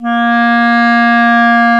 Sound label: A#2, REED; AKAI S-Series CD-ROM Sound Library VOL-8